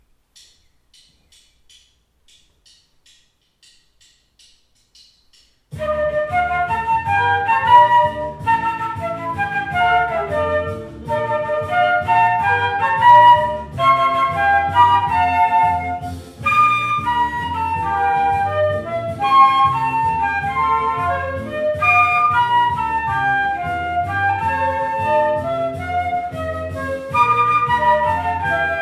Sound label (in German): Querflöte